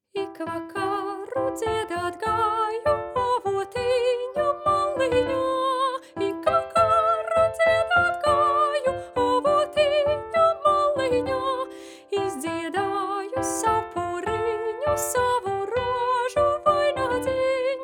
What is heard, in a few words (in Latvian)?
Tenors, vidusskolas jauktais koris; Ik Vakaru Dziedat Gaju; Apguves repertuārs vidusskolas jauktajiem koriem